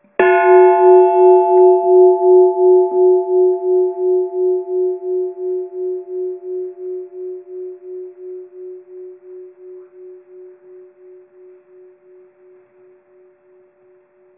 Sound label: Bell; Amazon